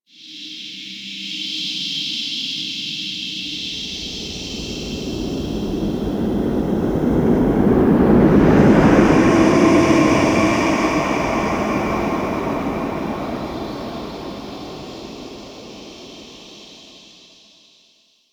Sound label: Cargo Hold Lifepod Intro; Call Of The Tempestarii; Wraith Quest